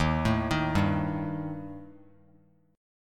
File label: D sharp Major Flat 5th Bass Standard; arpeggiated; D#Mb5; 11,12,13,0; JGuitar